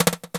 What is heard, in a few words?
Busy Pickup, One Shot Drums